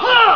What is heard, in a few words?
SCREAM